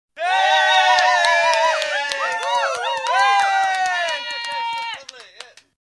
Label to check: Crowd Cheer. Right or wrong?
Right